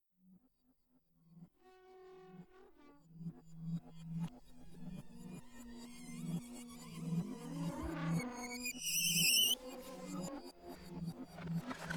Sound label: fanfara, percussions; Derbouka; "TAMBOURS SANS TROMPETTES" EMD 0601